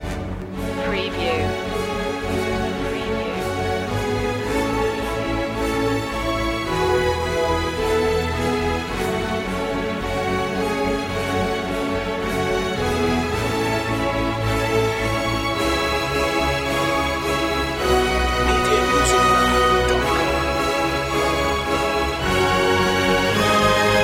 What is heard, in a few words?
no choir no perc